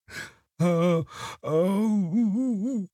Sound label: moan; devour